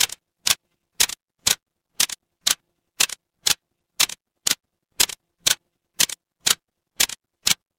mechanical gear

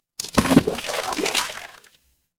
water splash

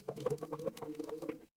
water pour

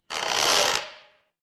door creak

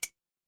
glass glass tap

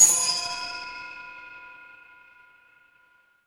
instrument bell

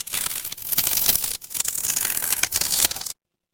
magic scifi portal

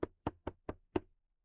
impact wood knock

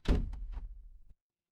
door close

